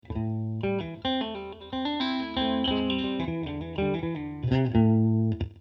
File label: strat, guitar